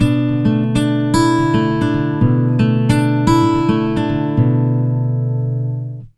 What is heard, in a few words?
110 MAJ PICK B, Acoustic Guitar Loops; USB Soundscan vol.04 - Electric & Acoustic Guitar Loops; AKAI